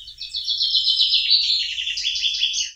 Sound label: bird; gmod; garrysmod; airfield